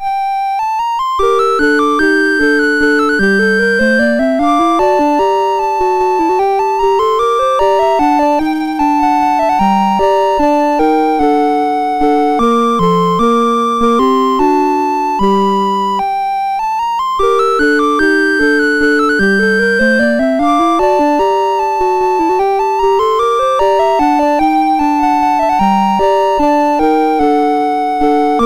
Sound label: MOS6581; MOS6581R2